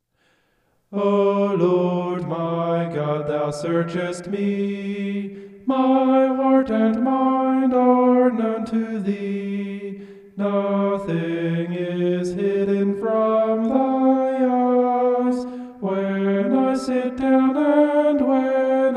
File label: vocal homoph; psalm 139